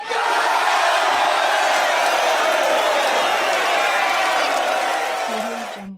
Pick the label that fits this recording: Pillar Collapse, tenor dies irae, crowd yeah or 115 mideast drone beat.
crowd yeah